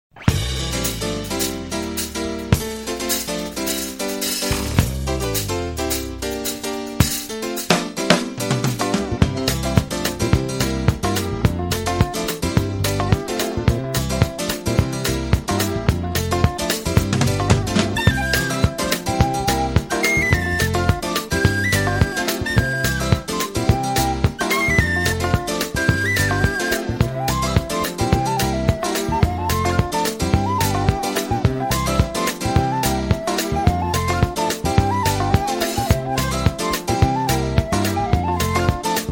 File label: My Kind Of Jazz